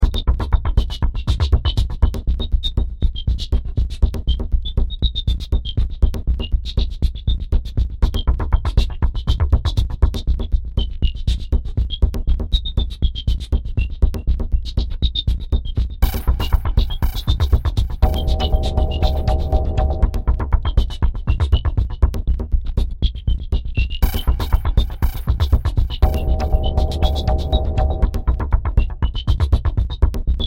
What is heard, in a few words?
Ambience